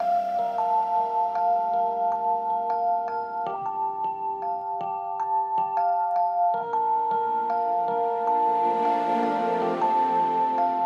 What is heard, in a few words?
music night loop; Necrobarista Final Pour